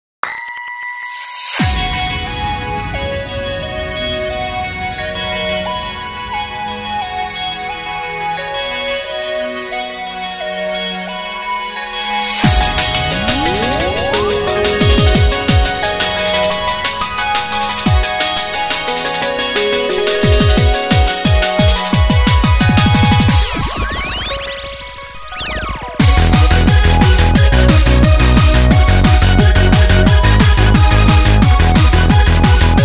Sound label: Blue Ocean End